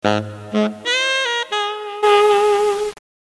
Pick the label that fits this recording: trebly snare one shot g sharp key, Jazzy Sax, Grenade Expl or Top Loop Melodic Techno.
Jazzy Sax